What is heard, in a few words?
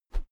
whoosh feather heavy; swooshes-swishes